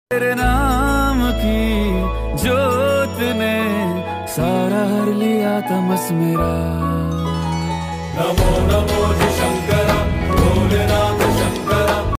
song bholenath song song bhakti

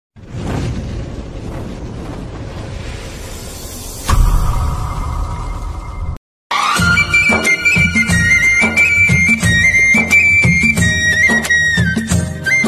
gujarati ringtone organ

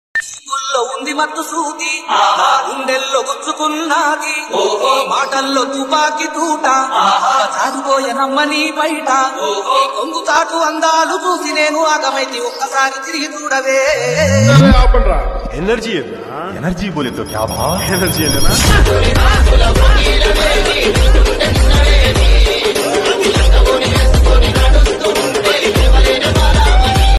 dj remix songs telugu folk

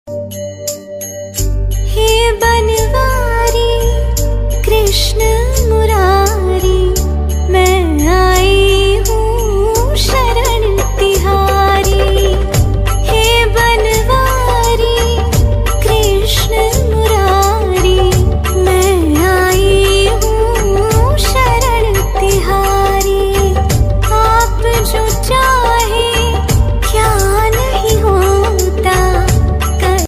bhajan ringtone; krishna; bhakti status

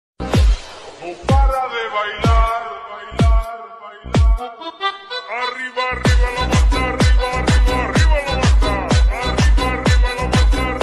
bike remix

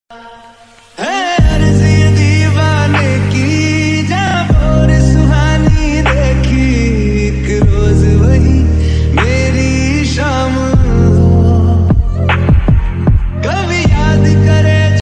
english bgm; happy independence day

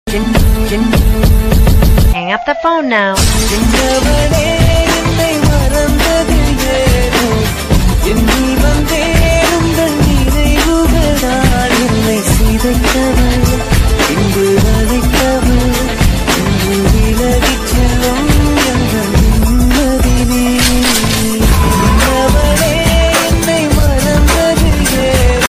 bgm trap

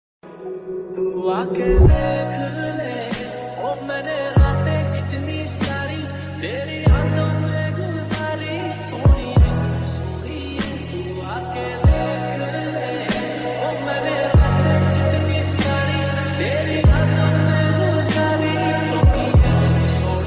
lofi slowed ringtone

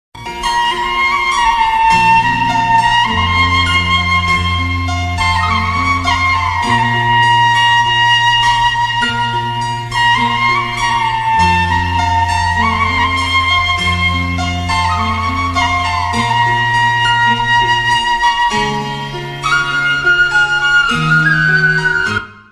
malayalam flute bgm ringtone; evergreen malayalam song; minnaram

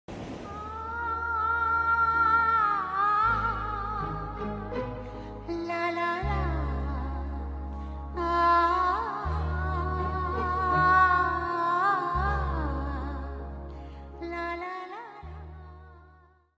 movie background music song instrumental ringtone; kabhi khusi kabhi gam

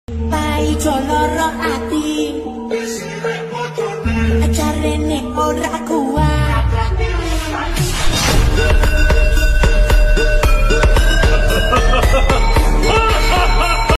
attitude song attitude ringtone; smokers

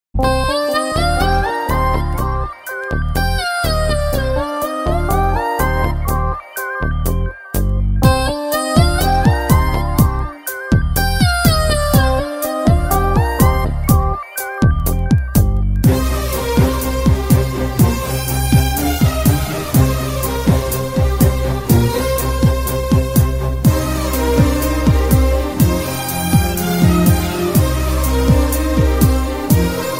Bgm Ringtone Tamil Love Ringtone; manmadhan

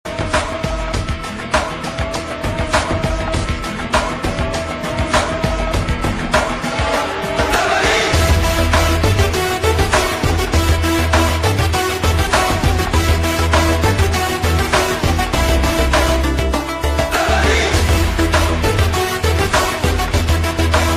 intro bgm ringtone; master jd intro; jd entry ringtone; thalapathy vijay

